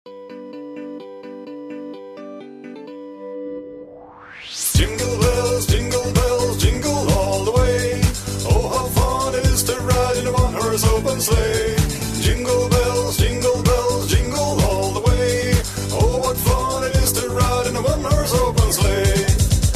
Jingle Bells

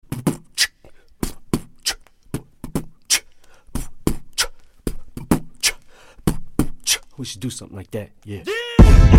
boom clap

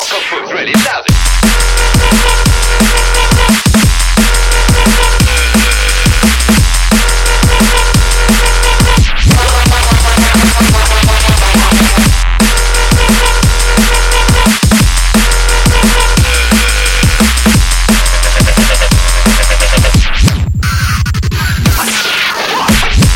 Dnb

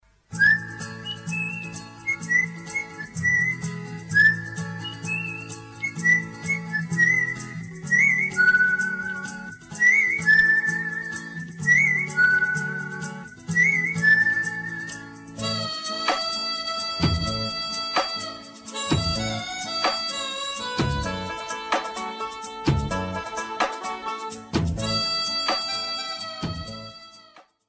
Melody

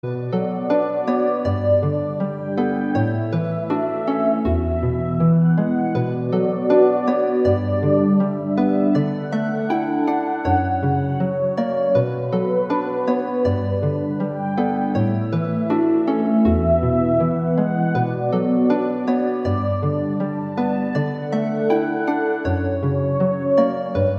Pleasant Video Game

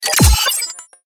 Ai Hacking

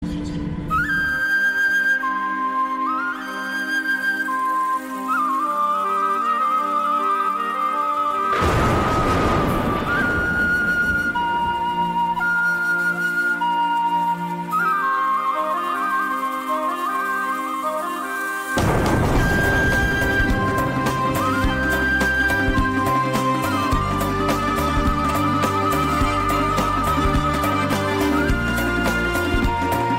folk; Gamethrones

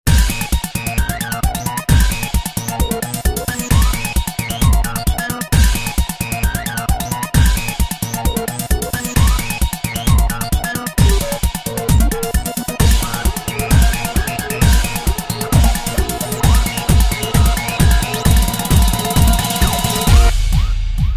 Russian Techno

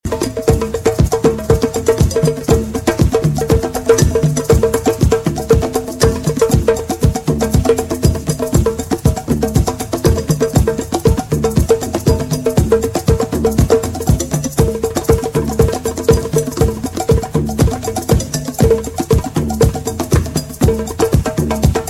Tribal Sound

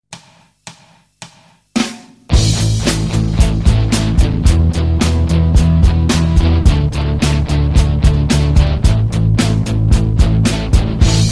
Rocking Ringtone

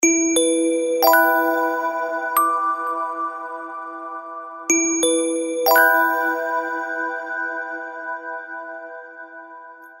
Sms New Nice2

